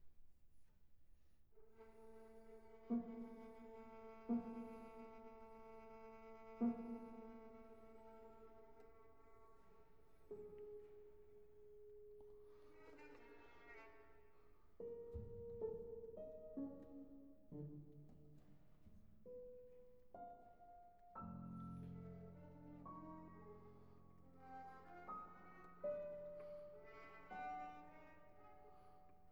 Pieces for violin & piano, Sehr langsam

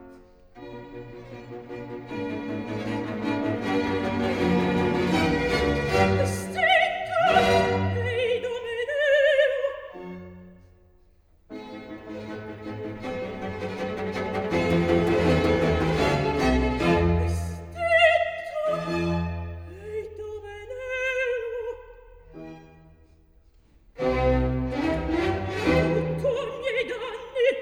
opera, Recitativo

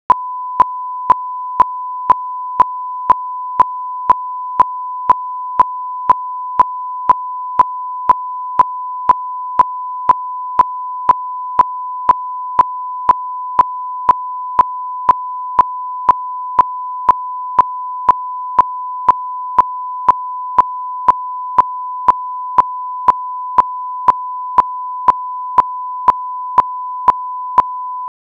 1001hz Toneburst 0db L+r; 20waves 0db/480waves -20db; Denon Audio Technical CD

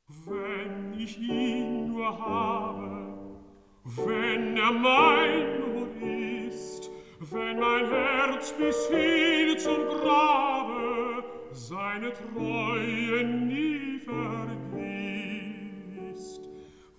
song for voice & piano

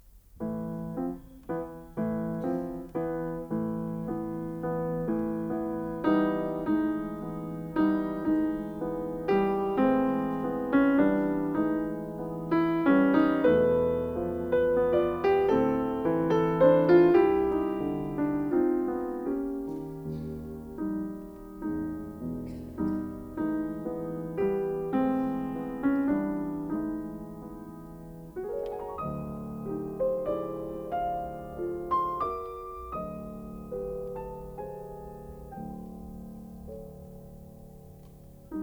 for piano, Assai moderato; Visions fugitives (20)

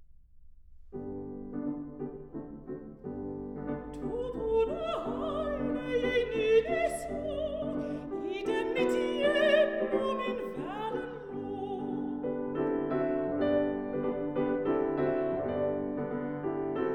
for voice & piano

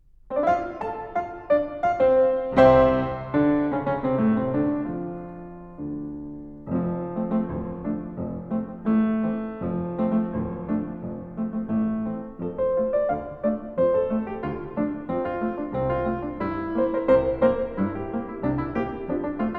march for piano; Satie; Le Piccadilly

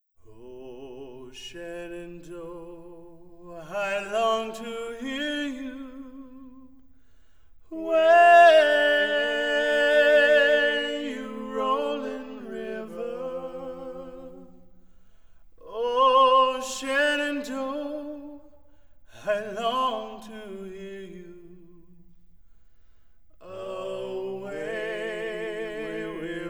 Male Quintet Test; kimber kable Isomike Tests 2005b

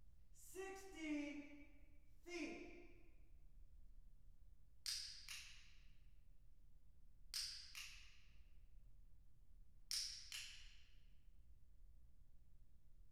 Depth of Image, Acoustic Clicker, 60 Feet

